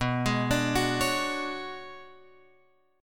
B Minor 6th Add 9th B, Guitar Open F; x,6,8,9,9,8; Bm6add9 arpeggiated; JGuitar

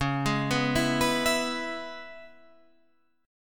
Db Minor 7th, Guitar, Standard, Dbm7 chord; arpeggiated; JGuitar

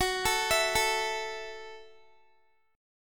F sharp Minor 7th F sharp, Guitar; F#m7 arpeggiated; x,21,19,21,21,x

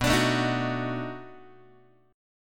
B 7th Sharp 9th, down, Guitar; strummed

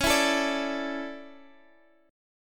A Suspended 4th C sharp

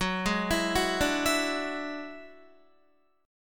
Gb 7th Suspended 2nd Sharp 5th, Guitar; arpeggiated; JGuitar

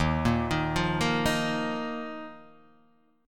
Eb Suspended 2nd; Ebsus2 arpeggiated; JGuitar

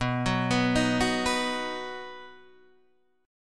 D sharp Minor Sharp 5th B, Guitar

